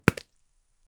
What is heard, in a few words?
realistic sound of throwi; animal-behavior